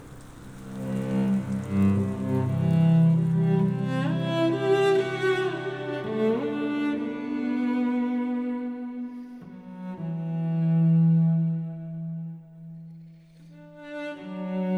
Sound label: Reprise (Orchestral)